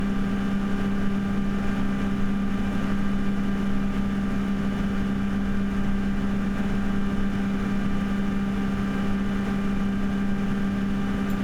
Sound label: Pull Max RPM